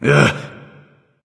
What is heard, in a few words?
"ugh"; smite; voicepack; ullr